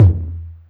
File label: Tom 808 DMX; Drums